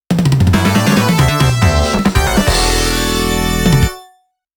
Stage Complete; Elden Ring Nightreign; Xbox Series X/S

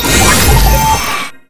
Shutter; beatmania IIDX 29 CastHour; System BGM

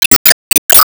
Enemy Woodblock Minion Active Heal

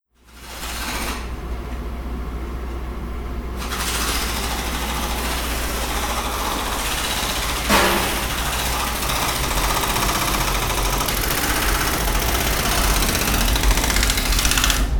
nyc Construction

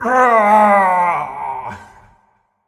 Death; Marv Death Alt

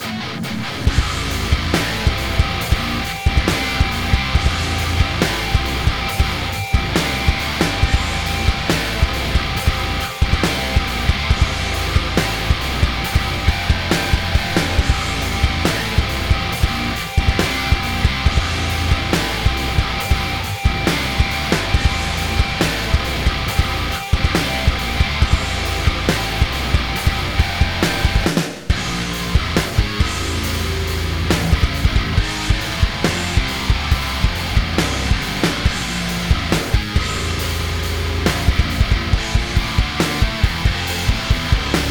Song Shopkeeper Battle

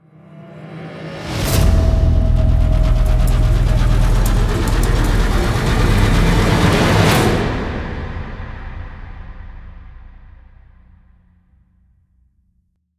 Stinger Modulate ramp

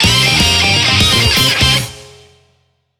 JINGLE create; beyblade x xone

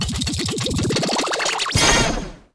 Perk Upgrade; Subway Surfers City; Android, iOS

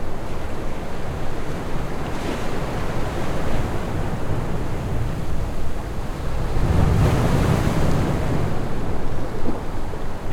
sea ambient; PS4; Black Book

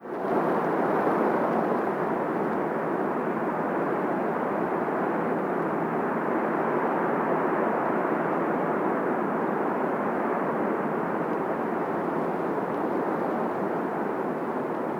Zion Wind

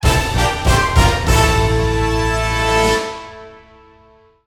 Music event; Power Pro Kun Pocket R

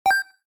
Pennypickup

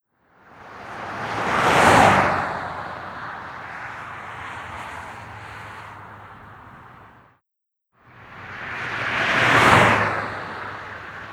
Cars; Arche

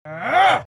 Mom Vox Grunt; The Binding of Isaac

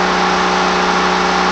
Turbo; Test Drive Off-Road 3

